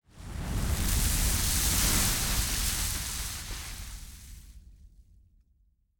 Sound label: explosion material water